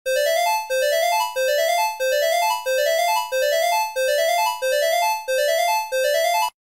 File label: 8 bit warning chimes